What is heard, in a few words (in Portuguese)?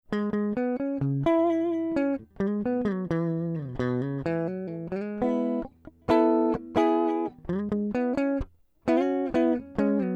Guitarra Linha